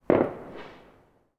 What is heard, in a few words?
firework distant explosion; sound effects one